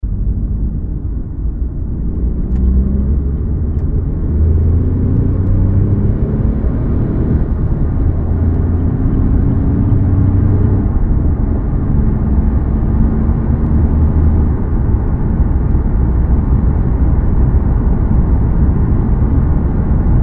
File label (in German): Windgeraeusche